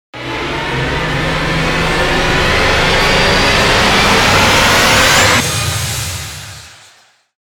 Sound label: Valiant Rise; Ninja Tracks